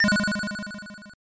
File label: Coin; Circus Charlie; arcade